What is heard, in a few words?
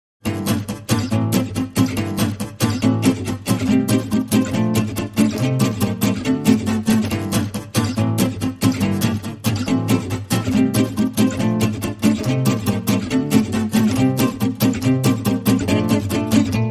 Guitars Only